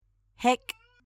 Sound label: hick